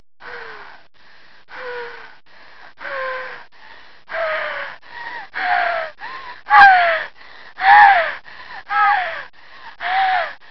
breathy orgasm

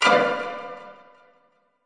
minor verby string hit; Orchestral

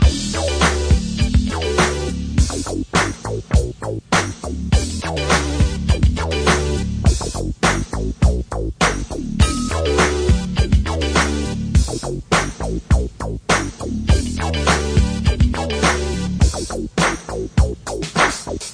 103 DRUM LOOPS and BREAKS

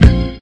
church kik hit; Kicks; single hits

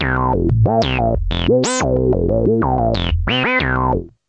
SH101, SYNTH AND ELECTRONIC

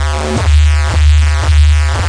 electricity beat, 115 bpm, drum loops and breaks

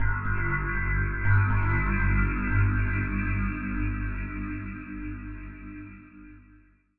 Digi Mass G1, Synth

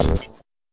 fx drum

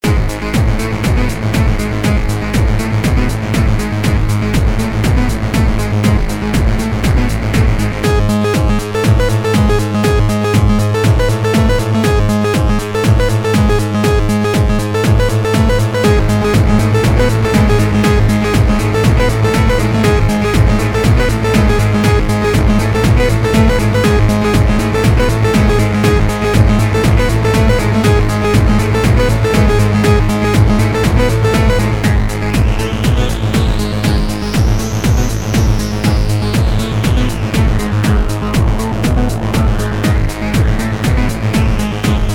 Elektronik

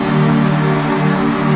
big rowdy pad; Pads

